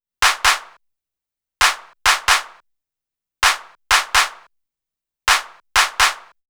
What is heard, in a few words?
130 HipHop Claps; Digidesign; Pro Tools; Pro Tools Essential Loops; Percussion